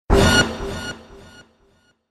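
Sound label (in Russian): оповещение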